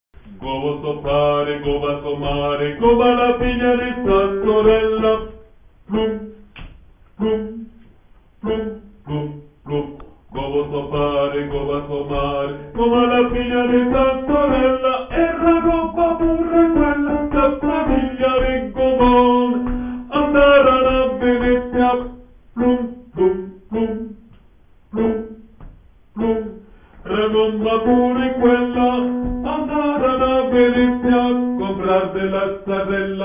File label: Tenors; Gobbo so pare